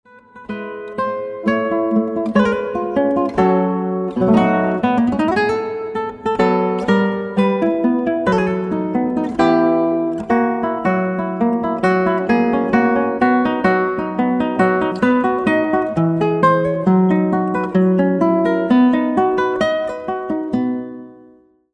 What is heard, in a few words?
MINUET